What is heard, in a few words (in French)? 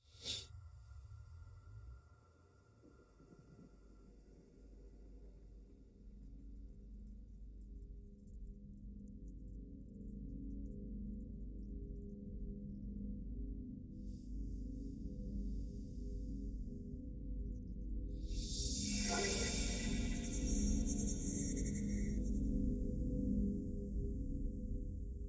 sons seuls extrait binaural